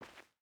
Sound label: footstep barefoot cloth walk; Rust